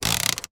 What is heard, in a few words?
transportation van cab temperature control dial move iveco; sound effects one